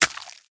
Egg Pickup Spider; The Inn

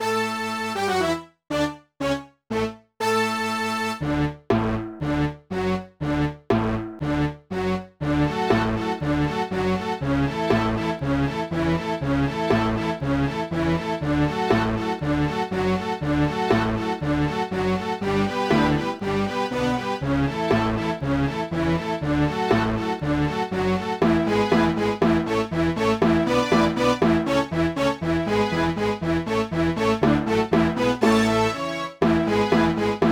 Classical Stage Karaoke; pinky street kira kira music night pop town gamerip

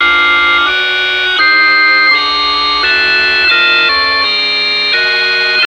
organ

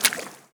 walk water

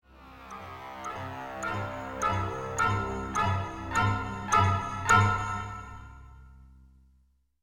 Music Suspense Short; nancy drew the phantom of venice windows gamerip 2008

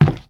Wood soft

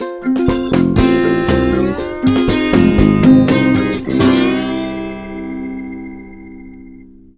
Jingle Normal; tamagotchi connection corner shop 2 ds; Kuchi

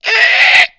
Pig Squeal

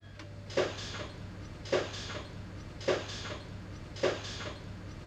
Minecart Move

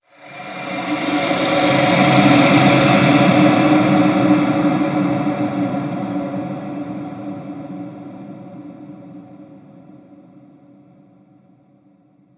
environment graveyard stinger ethereal

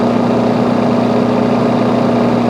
Amg C63 High; gamerip; Car Simulator 2; android

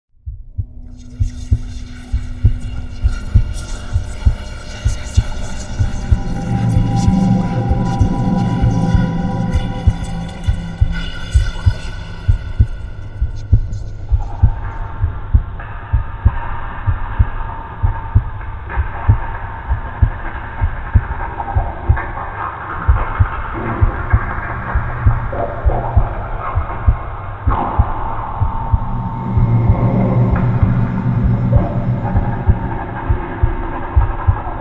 hospital music; cry of fear

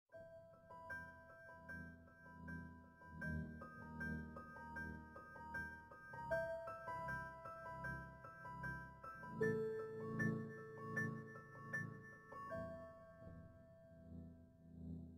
Loading Screen; The Amazing Spider-Man 2

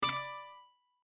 Chip Destroying; My Cute Commissar

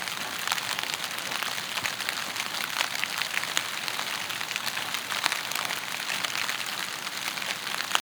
environment rain light outside vegetation

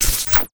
Moleman Shaman Start Spell

